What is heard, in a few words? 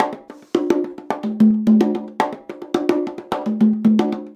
110 CONGA, Percussion Loops; USB Soundscan vol.36; AKAI